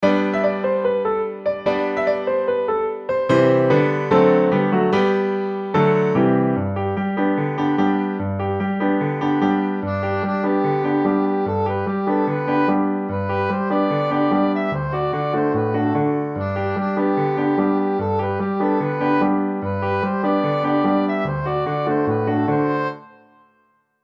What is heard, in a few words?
accompaniment, piano